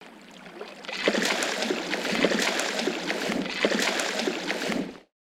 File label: Fish Jumping Splash